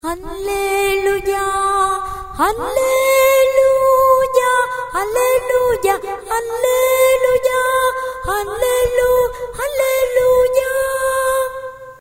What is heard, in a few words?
tenor